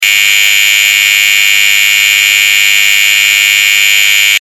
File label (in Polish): ton